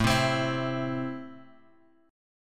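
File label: A Minor, chord strum; Am Chord {5 3 X 5 5 X} strummed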